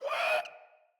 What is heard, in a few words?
Infested Runner Pain